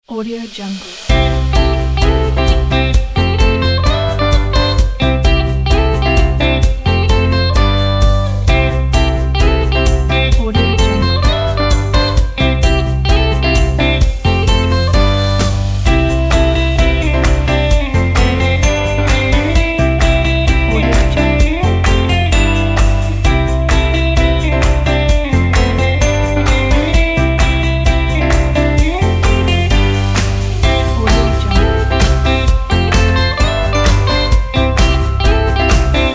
happy

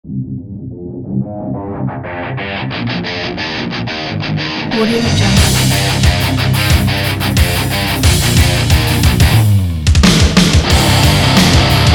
metal on

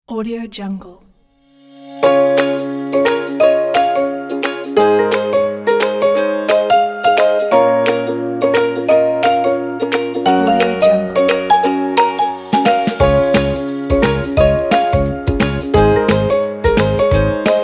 upbeat inspiring cheerful background for kids

